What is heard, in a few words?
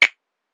Snap; Cymatics; Eternity Sample Pack; Drum One Shots